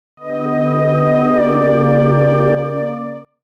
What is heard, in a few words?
Alien Sting; The Sims 2; Nintendo DS